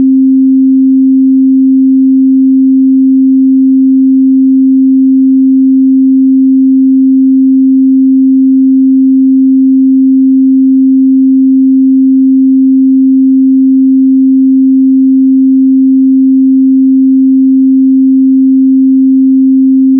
D string

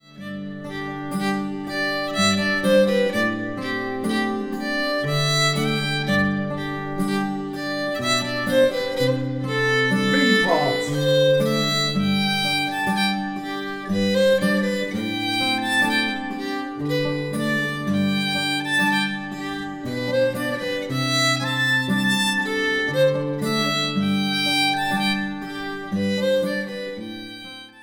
audio samples playalong

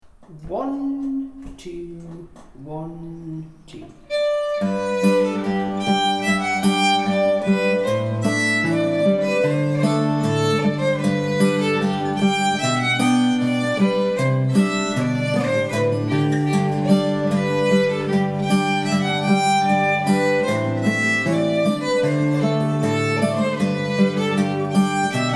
Because He Was a Bonny Lad Fiddle Tune Guitar

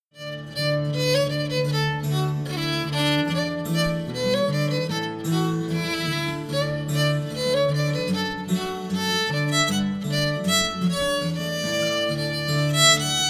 Playalong sample

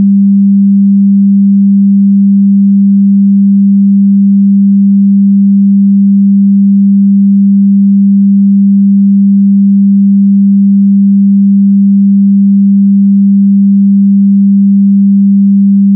G string G

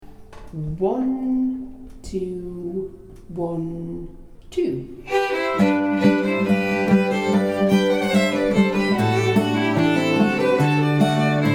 Fiddle Tune Guitar Harmony; Flowers of Edinburgh

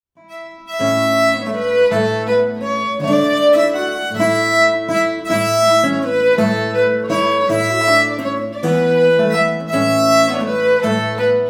51st Highland

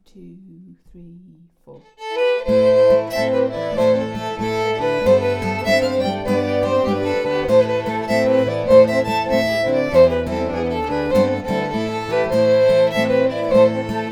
Gallowglass Jig full speed

